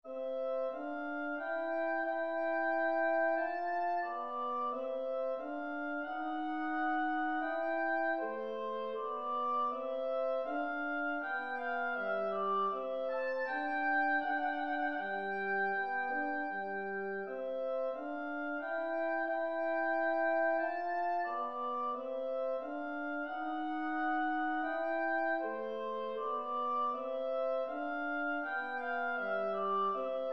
menuet

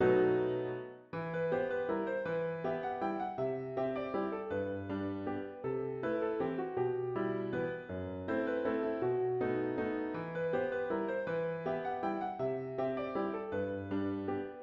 valse salon

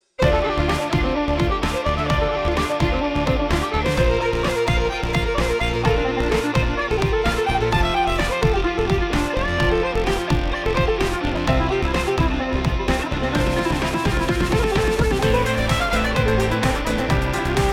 house euphorique

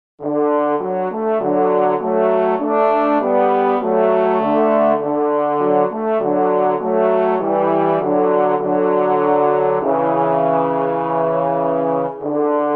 petit duo en re pour trombones

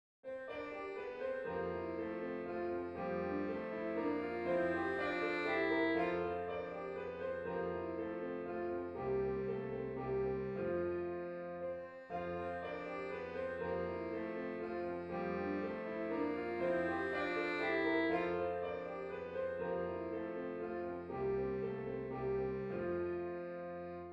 valse fa majeur; beethoven ludwig van

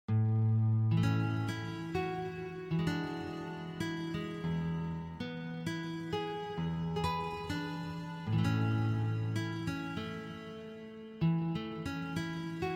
guitare

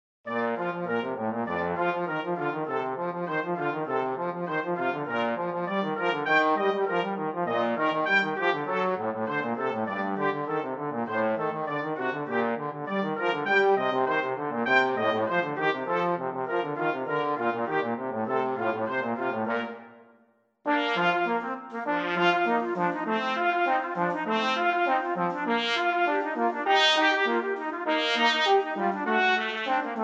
duo pour trompette et trombone; vignon denys

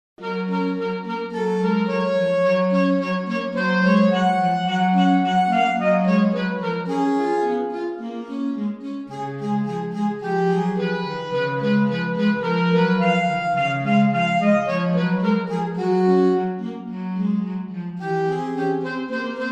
duo pour saxophones; vignon denys

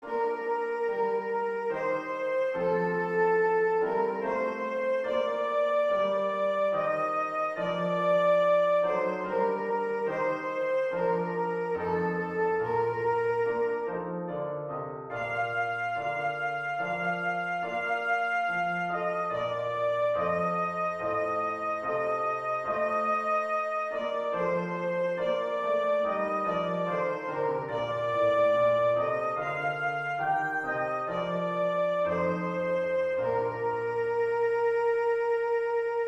hymne national anglais

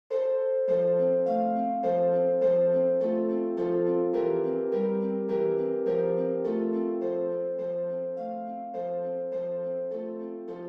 danse allemande; beethoven ludwig van

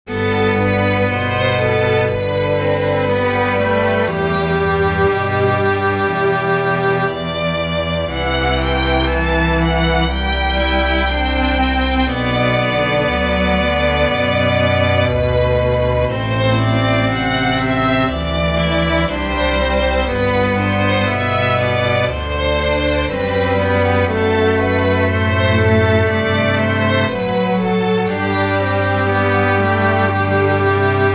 petit quatuor cordes; quentin quessary

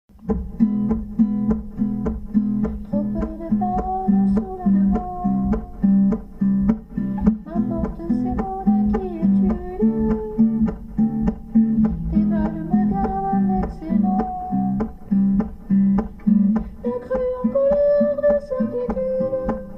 chanson sans paroles

